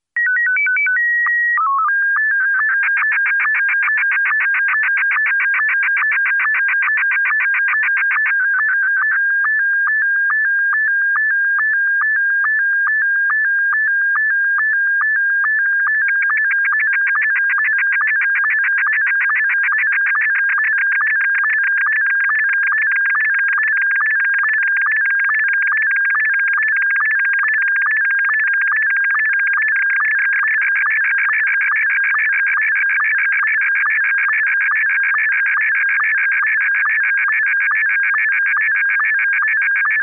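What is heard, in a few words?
SSTV SCOTTIE1; ham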